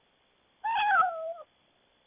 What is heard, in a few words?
cat meow